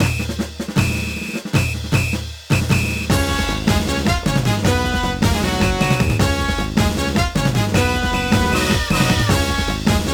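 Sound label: background music boss; cheerleader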